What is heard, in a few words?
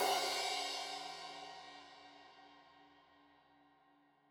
Crash 15in L edge, Cymbals Stereo